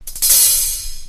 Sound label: HiHat Roll